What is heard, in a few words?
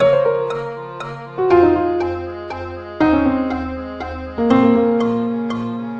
080 piano accordion metronome melody; KEYS ORGAN PIANO LOOPS